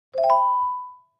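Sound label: Clavichord Short Arpeggio; keyboards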